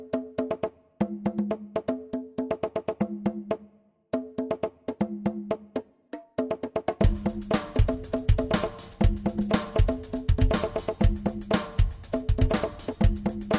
conga mix; latino

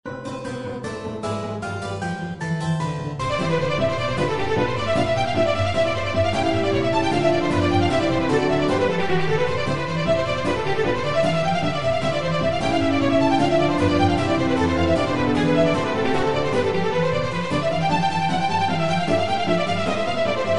melody ringtone; ringtones

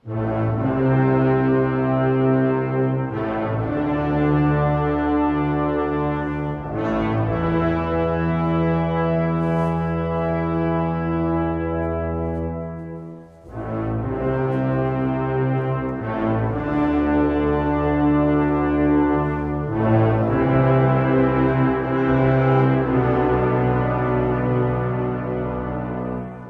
Horn of Dale; Hobbit

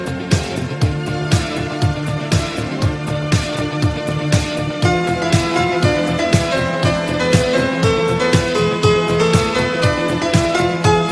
melody

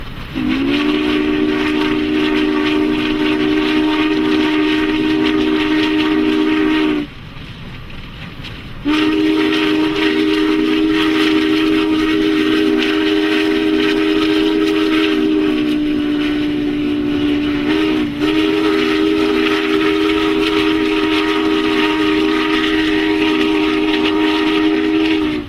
train whistle; alternative

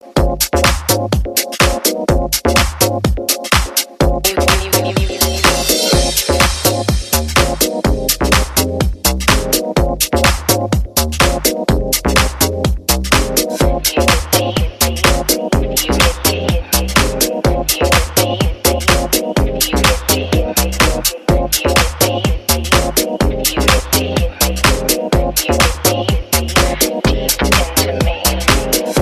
ChillHouse Remix

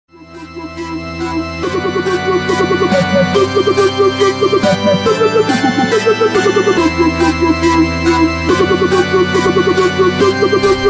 dubstep remix; lavender town

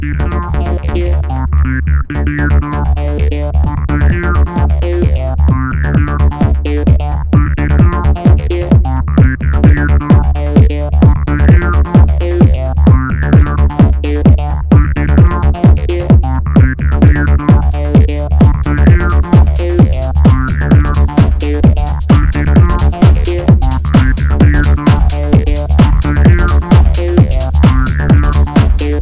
first beat

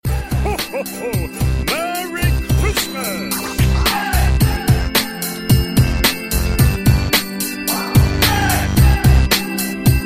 new christmas sound

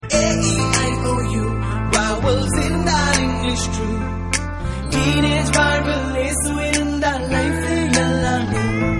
tamil gospel; christian

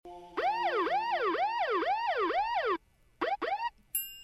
Police Alarm

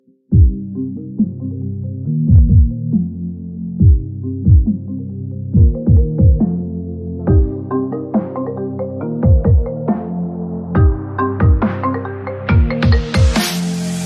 ultra dubstep ring

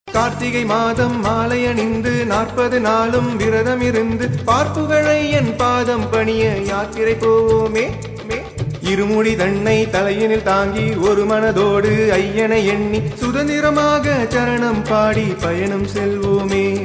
tone, ringtones

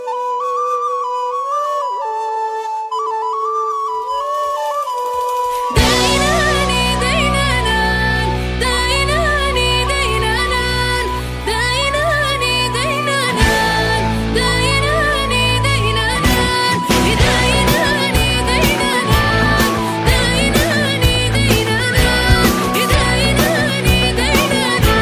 Rock chaoui

